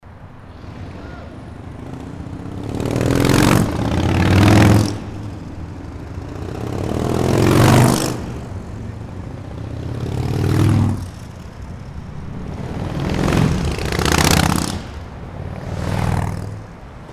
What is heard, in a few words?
Motorcycle movement